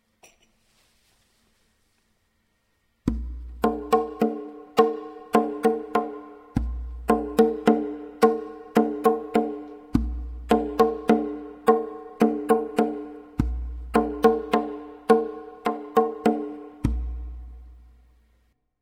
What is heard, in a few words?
tonbak; The right hand bak is substituted by a meyaneh.